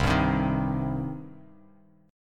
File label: C Minor C; strummed; JGuitar